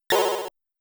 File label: Pupa Hit Sound; Motos